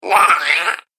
Horror Gremlin, monster

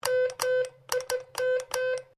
MORSE CODE COMMA; Morse code aplphabete; Morse Code Sound Effects; Morse Code (Sound Effects:Electronics)

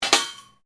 METAL PIPE IMPACT; Metal Sound Effects; Metal (Sound Effects:Materials)

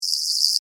Animal, Beetle, Chirp, Vocalization, Insect, Bug; Beetles

